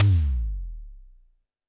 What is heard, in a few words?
TD-20 Tom B, Roland TD-20 Toms